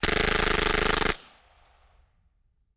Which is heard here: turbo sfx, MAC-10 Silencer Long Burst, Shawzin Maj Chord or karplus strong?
MAC-10 Silencer Long Burst